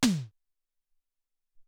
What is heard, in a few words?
Hey Tom, Alesis SR-18